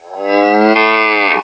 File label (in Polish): krowa